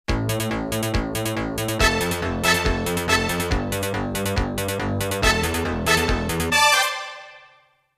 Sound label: Jingles; 90 DDD Jingles